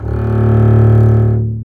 STR ARC BS, Cb Bowed, Cb1 mf vb

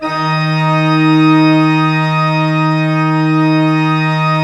PLENO D3, Cathedral Organ; ILIO; Propeller Islands

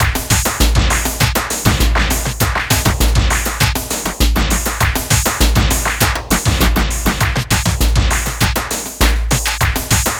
TECHNO; INIS; Funky Xtreams

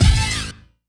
F#, synth line; 90 s Sample CDs; NiceBeats; SynthLine VOL-3

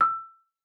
MAR E6, MARIMBA; Xsample VOL-4

